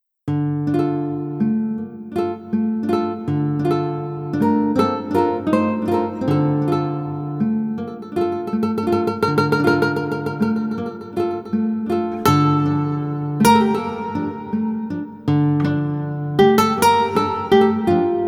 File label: Small Spanish Port; pirates of the burning sea game soundtrack 2007